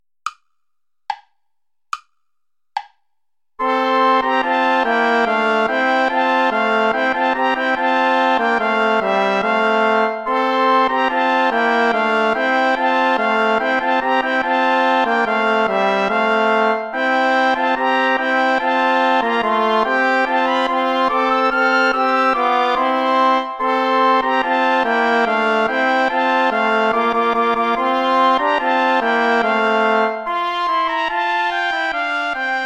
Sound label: poupa canique play along without trombone; traditional